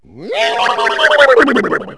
horse; Wired for Sound Pro CD; Aristosoft, Inc.

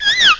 squeak; Take2 v1.7

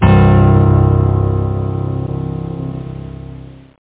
big note; Super Games Pak (1991)(Odyssey)

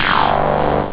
future, rave

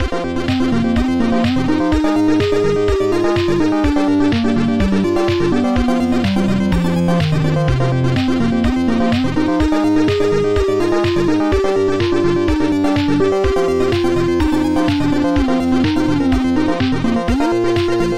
tune

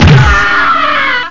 Big hit; Carmageddon

